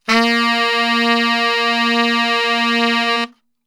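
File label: A#1 SAX STR, saxophone; Best Service; Brass Super Section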